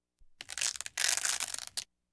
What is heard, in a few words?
Household Phones